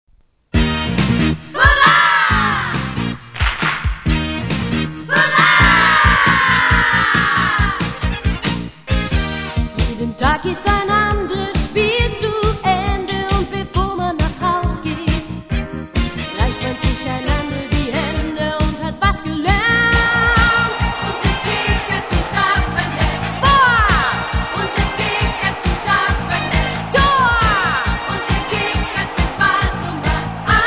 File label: Opening German; ganbare kickers